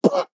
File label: player hit; fallguys